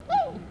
Cygnus buccinator; Trumpet swan; Single trumpet call

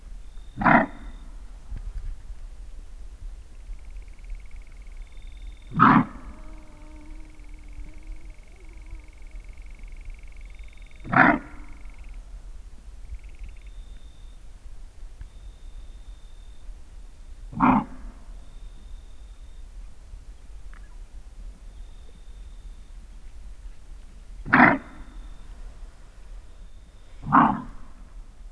Tragelaphus strepsiceros alarm; Greater kudu; Female kudu alarm barking

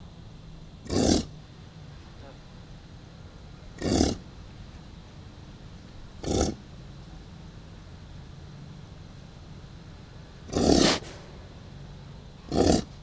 Bison bison; American bison; A female bison is snorting when waiting for the feeding

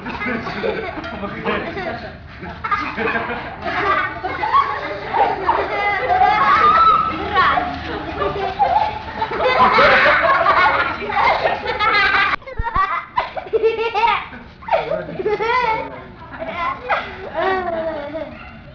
Homo sapiens laught; Human; Humans have only a limited number of nonverbal vocalizations; The laugh of children and adults, looking at monkeys

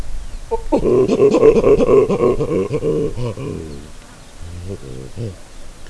Papio cynocephalus ursinus pant; Post-copulatory female calls at two phases of breathing, another group of baboons

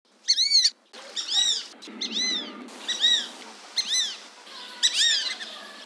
Dendrocygna bicolor males; fulvous whistling ducks

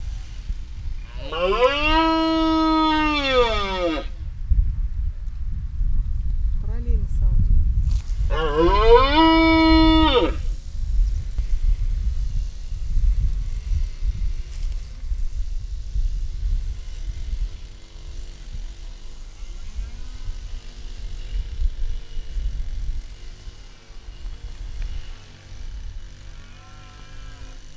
Cervus elaphus hispanicus male; Roars of Spanish red deer stags (C.e. hispanicus) sound higher by ear compared to males of other European subspecies of red

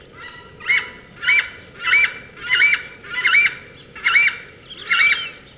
Chauna torquata two bird; Male-female call-over, one bird is calling from a distance, whereas a second one is very close

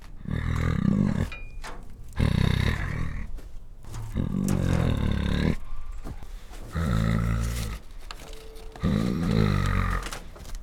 Panthera tigris sad new; The same female tiger but already at the age of four years communicates with humans as before, but now with growls

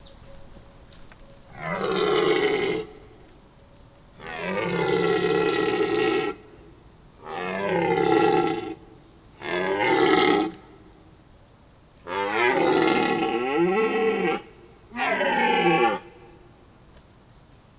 Cervus elaphus hippelaphus male; This stag of Middle-European subspecies (C. e. hippelaphus) has no competitors during the rut, but nevertheless he roar and chases hinds act

